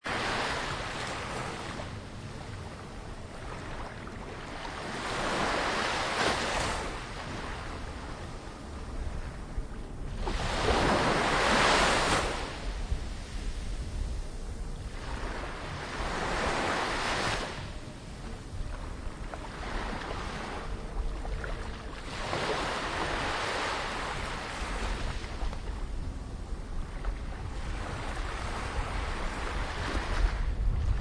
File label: sea waves